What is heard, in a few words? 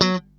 F#3, funky guitar